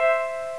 Rail Crossing Seibu

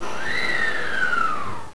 Brake

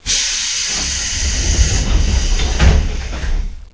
Door JR205 Close

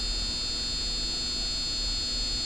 seibu 30000 SIV 8bit

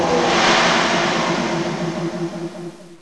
Compressor C2000 End

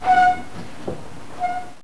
Grate

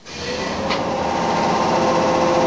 Compressor C2000 Begin

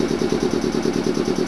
Compressor

